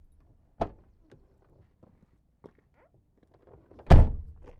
Car Door; FXHome

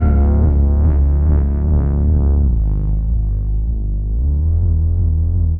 pitched, Feedback; Feedback FX; 99Sounds; Dark Engine

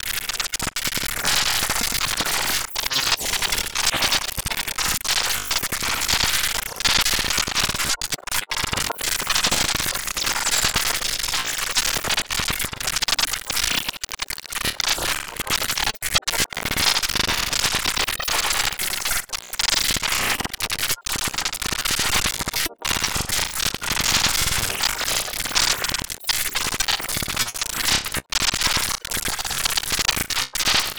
Process Malfunction Computer Glitch; Glitchedtones

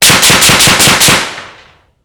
FAMAS G2 5.56mm in front off axis Six shots; 55m

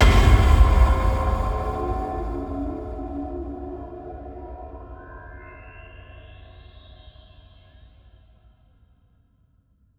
Long Evolving, Cinematic Impacts; 99Sounds; Rumore

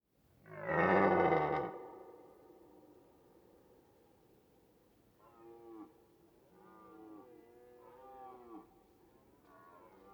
Red deer autumn Roaring, European mountain forest; European mountain forest animals and soundscapes; Ivo Vicic

